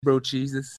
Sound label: applause; Jace 6.25